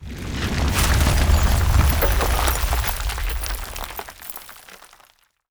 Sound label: frag debris; exp frag